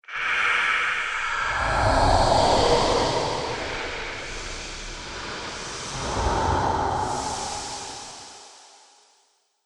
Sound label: Pyramid Phantom, ghost sound effects; Phantom Sound Effect - Surreal Ghostly Apparitions; Andrew Fleming